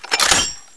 m79 clip out, weapons

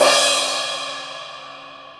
drums

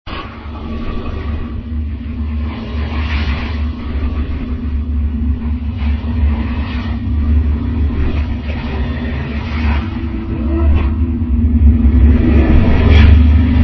ambience assassin, zombie plague

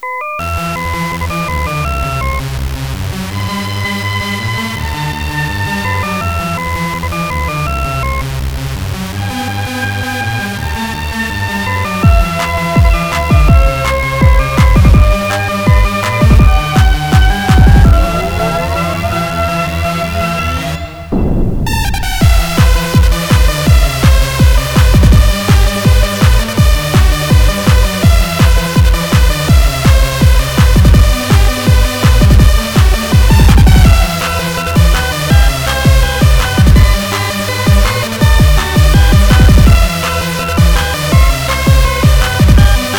jam

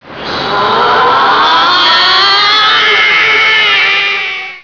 powerup; shmod

